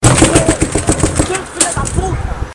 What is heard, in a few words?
rage